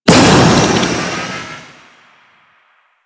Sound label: Forward Transition; Warframe; Vehicles; Archwing Demolition